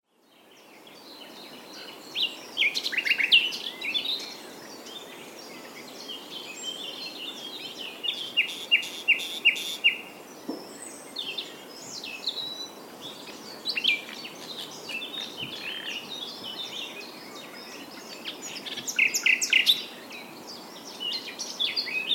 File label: mill stream nightingale